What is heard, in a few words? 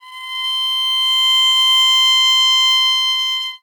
Violin Soft C5